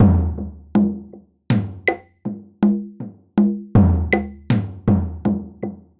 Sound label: Drum Intro; Kung Fu Fight; Wii U